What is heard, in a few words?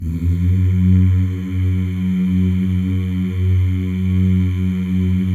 male; Spectrasonics; Symphony of Voices